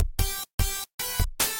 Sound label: Game Over; ESWAT City Under Siege; Genesis